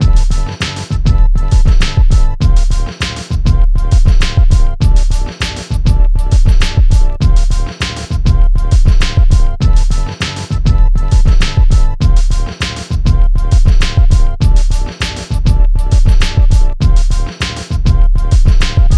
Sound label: Loop 100 Bpm